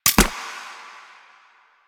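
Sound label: Mechanism, Sidearm; Weapons; Warframe; Tenno; Wraith Sidearm; Epitaph Prime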